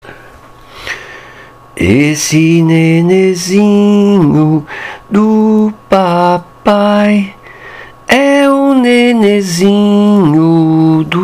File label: vocal